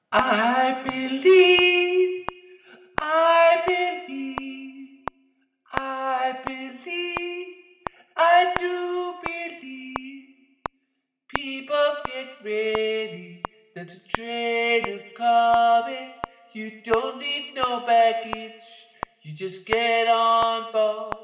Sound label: 2 ALT